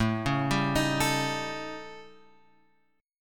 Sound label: A 7th Flat 5th, A7b5 Chord; {5 4 5 X 4 5} arpeggiated